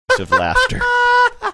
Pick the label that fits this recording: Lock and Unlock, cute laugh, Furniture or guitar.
cute laugh